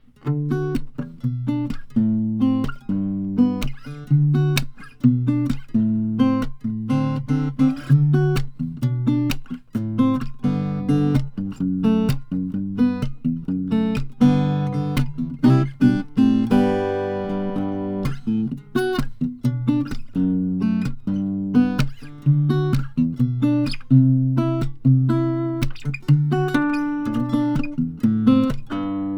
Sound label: santa cruz indian rosewood